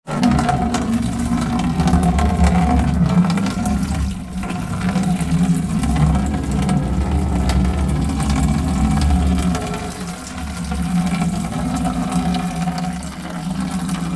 household water slow from hose into empty plastic watering can; zapsplat